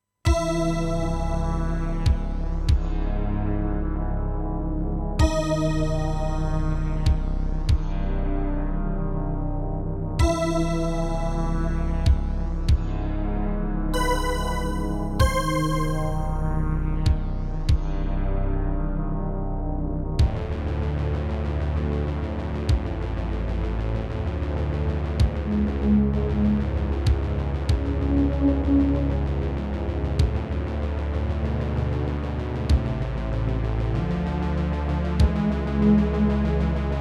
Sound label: SC880; dune 2 the building of a dynasty